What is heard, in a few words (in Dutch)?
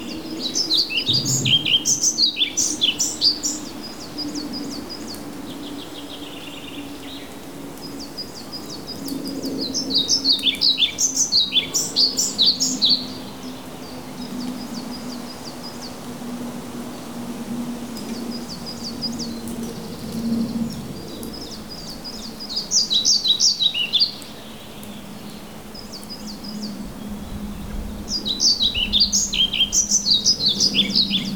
Bonte Vliegenvanger, ficedula hypoleuca